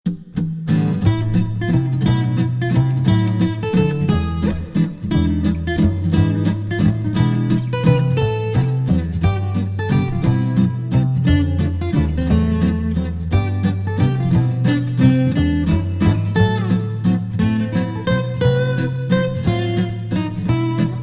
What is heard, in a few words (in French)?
la roulotte guitare